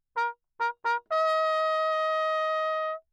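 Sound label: trumpet, without mute, signal; Perinétventiltrompete; Lechner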